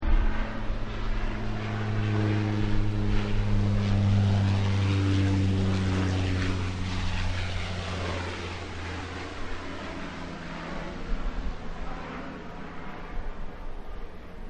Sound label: prop plane